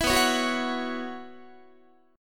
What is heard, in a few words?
B Suspended 2nd Suspended 4th B Guitar, chord; Bsus2sus4 strummed; 4A,4E,5A,5D,5G#,6C#; 19,19,16,16,17,17; JGuitar